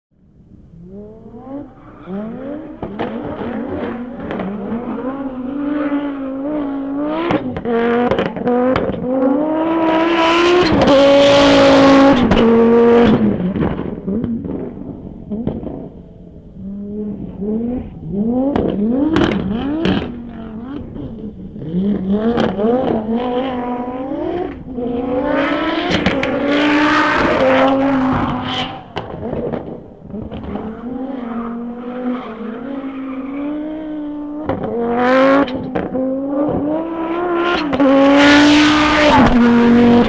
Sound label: audi s1 sound, Geiler S1 Quattro Sound!